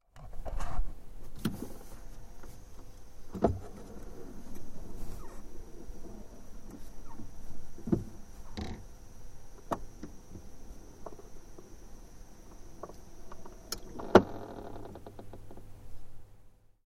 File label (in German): Geräusche Plattenspieler